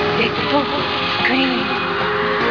dead scream

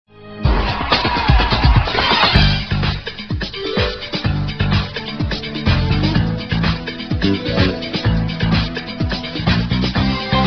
Aahs

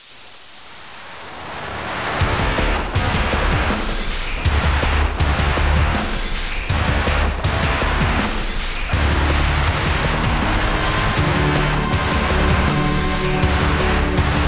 quake

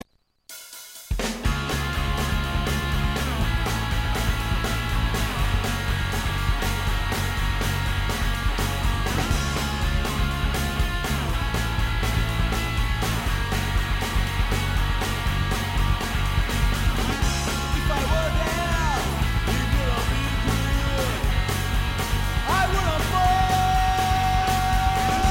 Scream

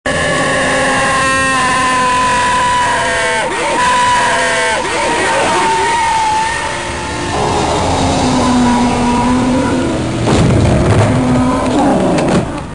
death; Predator's death scream.

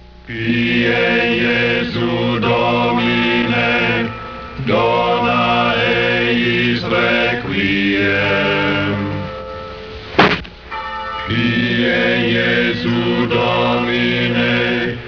monks; Monk chanting

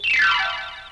noise

